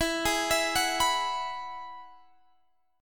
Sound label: E Major Add 9th E, Guitar, DADGAD